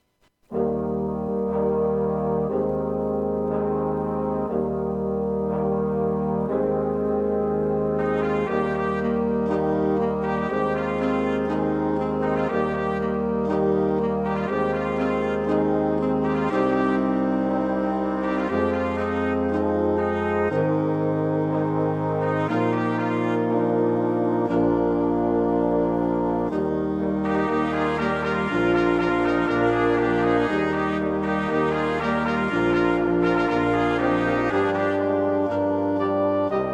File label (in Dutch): blaaskapellen; arrangementen